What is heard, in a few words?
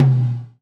GATE TOM, Drums Acoustic; USB Soundscan vol.10; AKAI; 90s sample CD